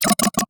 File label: Drone; rabbids multiverse; iOS, macOS, Mobile